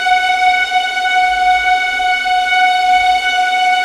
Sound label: tremolo; Level4 Hidden World